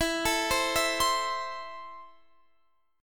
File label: E Suspended 4th Sharp 5th; Esus4#5; arpeggiated; x,19,19,17,17,20